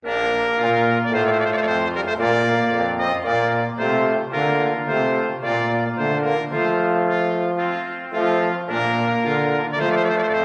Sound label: Trumpet Voluntary